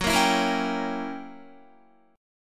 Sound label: Gb 7th Flat 9th Gb Guitar; Listen to Gb7b9 strummed